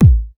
hard bass drumz

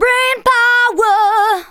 VOCALS SUNG; Techno Trance Essentials

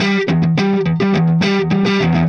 Clinical Distorted Funk Guitar; Sonic Foundry (Sony Creative Software); Indie Rock Anthems; Session 02 - Clinical

